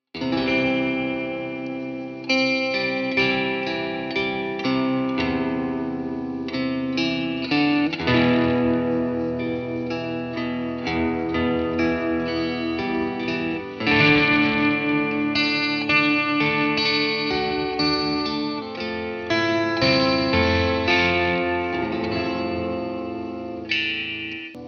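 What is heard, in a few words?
guitarix plus calf limiter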